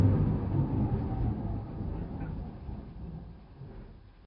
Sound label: elevator stop; openspace3d; FPSDemo